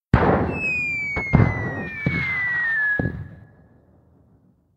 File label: cannons